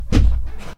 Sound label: punch